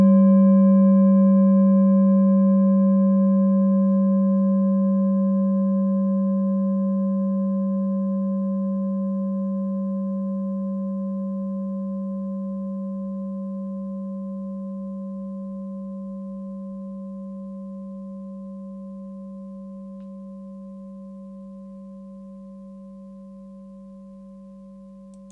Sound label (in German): klangschale orissa